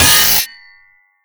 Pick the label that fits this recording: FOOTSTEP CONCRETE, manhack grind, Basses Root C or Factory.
manhack grind